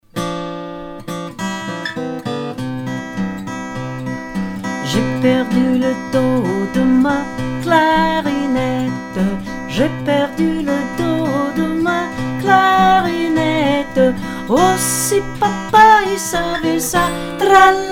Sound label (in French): j'ai perdu le do de ma clarinette vocal; French